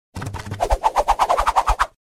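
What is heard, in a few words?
arrow flying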